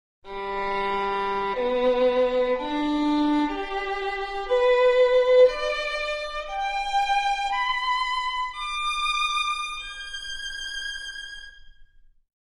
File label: violin cepstrum mod